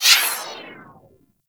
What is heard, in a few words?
Skate Stride; Enemies; Skate Movement; Corpus Modular; Warframe; Corpus Modular Crewman